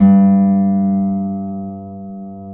G1 HARP; USB Soundscan vol.62; AKAI

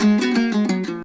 HALAM MELODY; Best Service; World Colours

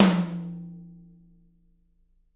TT, Toms; Bob Clearmountain Drums I

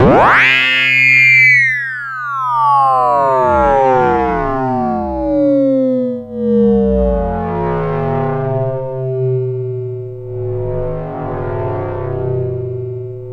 YAMAHA CS80 RING, Vintage Keyboards; Big Fish Audio - First Call Vintage Keyboards

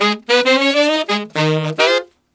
Sax Section in Ab7, Jazz; Sonic Foundry (Sony Creative Software); Jazz Solos and Sections

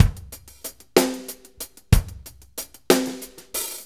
6-8 Loop, Full Kit Loops

